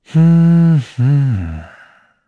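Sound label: Vox Hum, Korean; Lusikiel; kingsraid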